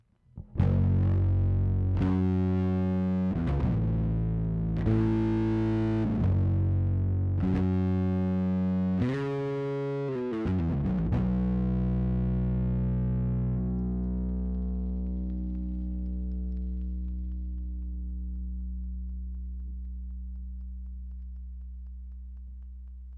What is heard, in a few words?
distorted, bass DI effect pedal; MXR